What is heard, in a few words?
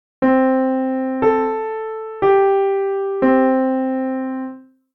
Melody